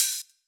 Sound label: hat